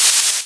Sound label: parry; SupaLidlGame